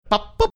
bop boop

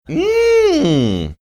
mmmm

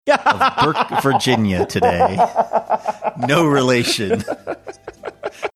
having a good laugh

